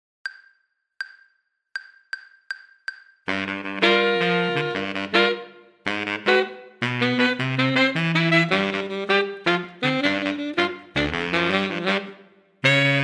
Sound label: playalong tenor